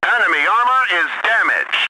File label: hit; TankWar3D